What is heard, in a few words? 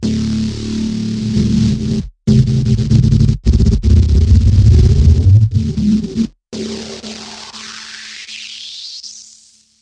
Teleport